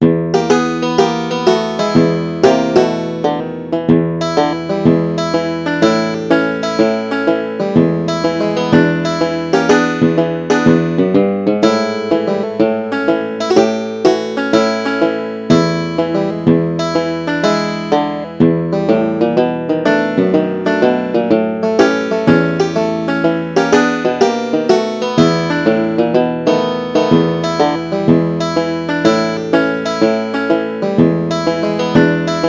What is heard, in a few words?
bluesin